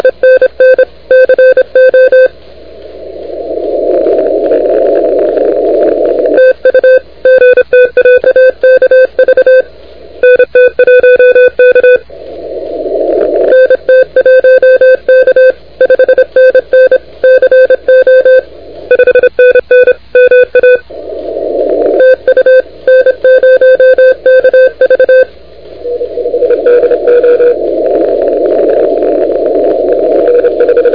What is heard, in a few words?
CW 28MHz; ARRL10M